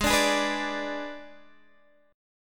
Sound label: G sharp Diminished G sharp, down, Guitar, Open D